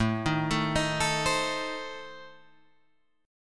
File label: A Diminished A, Guitar Open G; chord